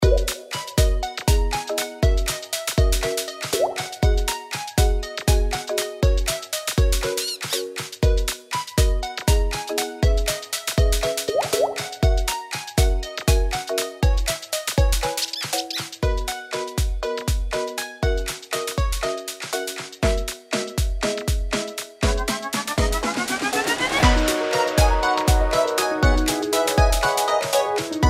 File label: Rainy day dream Loop